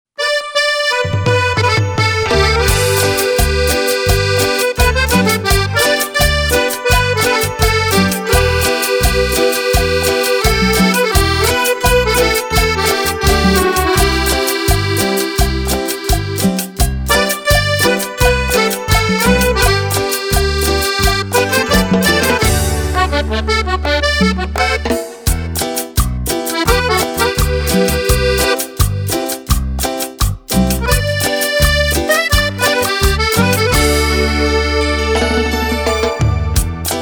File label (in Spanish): Versión Cumbia